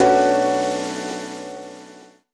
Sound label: LOOP, Akai MPC5000